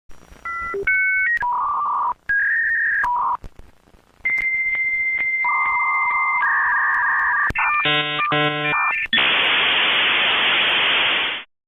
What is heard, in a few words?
Dial Up Internet Sound Effect